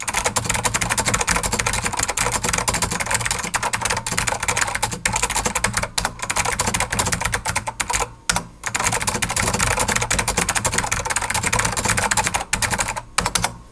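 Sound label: Dell AT102W (hybrid blues), keyboard; Xmas-KB-samples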